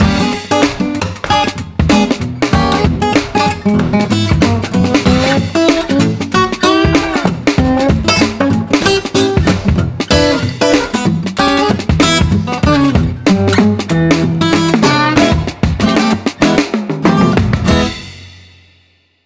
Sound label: Guitar; Coolpad; Dazen-X7